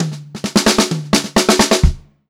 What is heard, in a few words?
128 funky fill, funk groove drumloops; USB Soundscan vol.38; AKAI